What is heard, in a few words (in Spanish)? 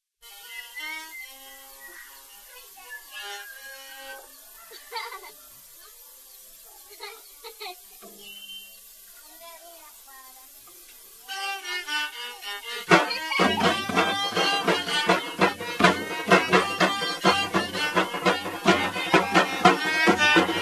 Violin; Moxeten; festival luzmila patiño; fundacion patiño